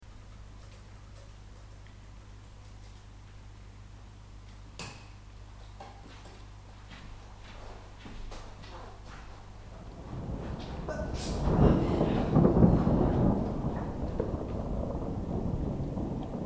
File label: rain thunder and other noises